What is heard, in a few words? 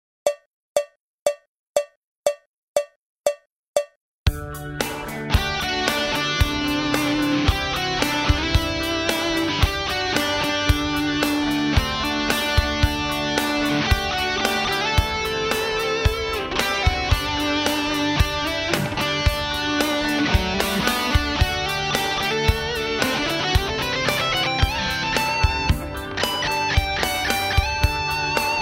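bridge high gain, burstbuckers; 2007 r9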